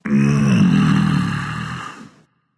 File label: content, voice; Smite; ymir skin14